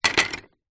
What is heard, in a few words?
leisure toy train wooden track pieces several place down in plastic box; zapsplat